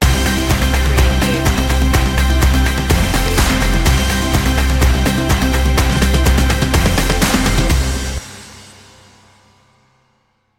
folk coachella stinger

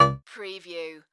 Multimedia Error

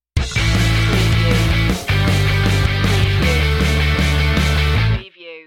fist pump stinger

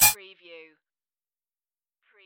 hover

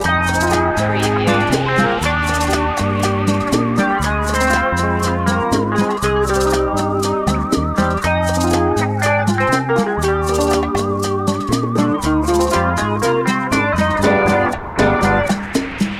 bolero loop

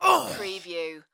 Male Grunt, Human Injury, Pain, Vocal Ugh, Ouch

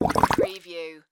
Bubbles Large Short; sound-effects-bible

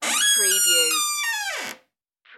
old squeaky wooden door

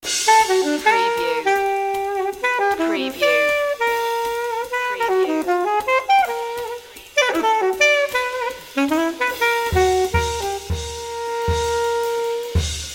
alto leaps sting

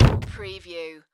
Wood Impact

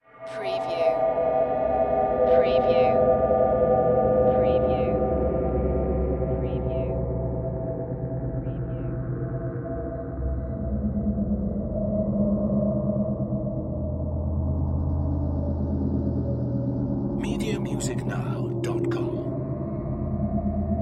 Drone Ghostly Swells; Sound Effects Bible

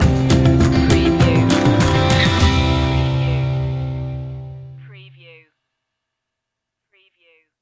walk together stinger

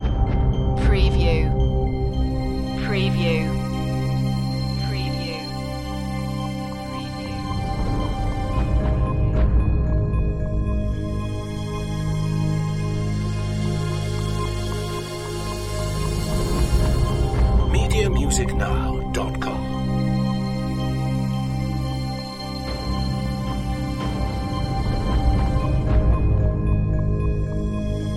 dark horizon

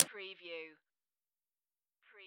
Pinball sound, ball hits metal lightly

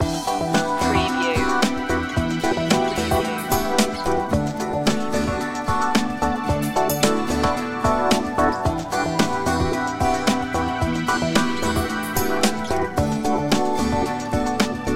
acoustica loop

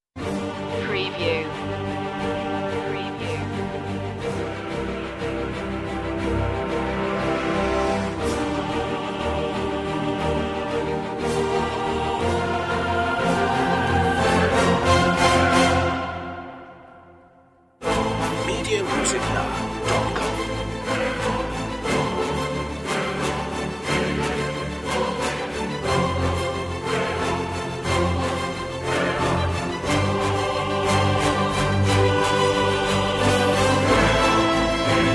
no perc

